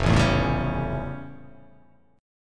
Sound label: F Augmented Major 9th F